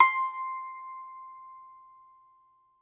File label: BAGLAMA, Plucked Strings